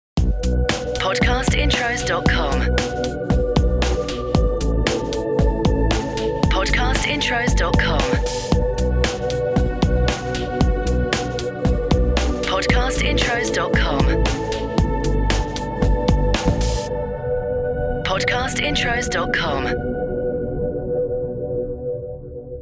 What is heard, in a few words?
CORPORATE; Podcast Watermark